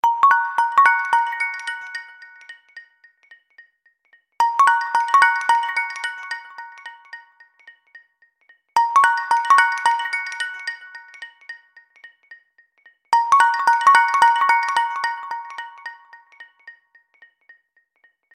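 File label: standard sound htc one; odna-iz-standartnyh-melodiy-htc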